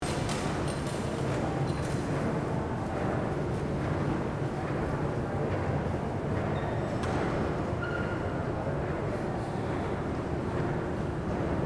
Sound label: Large hallway echo walla human movement loop